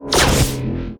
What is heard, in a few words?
Lob Lava attack